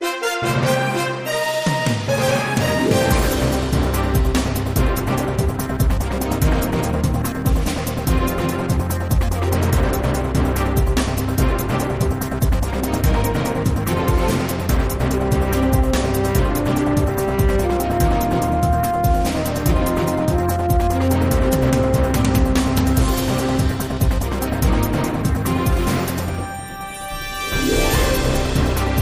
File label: Breaking News Opener